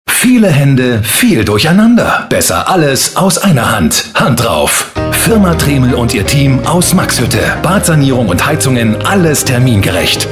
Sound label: Radio Jingle